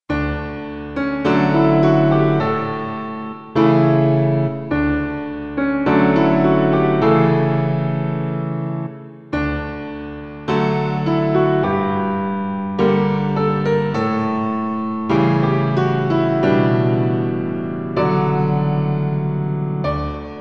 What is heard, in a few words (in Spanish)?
preludio para piano